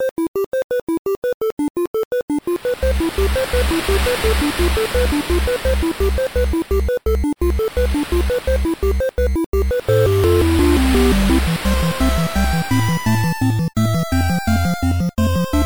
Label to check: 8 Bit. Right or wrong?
Right